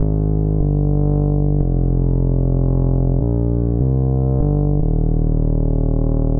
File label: Bass after Bounce